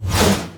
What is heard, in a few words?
Ball Flying; Knight Online